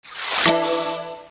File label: Text Suck